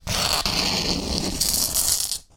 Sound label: Nails on Chalkboard; soundscrate